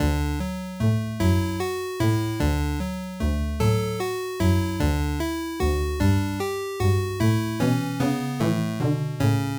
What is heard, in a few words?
8-bit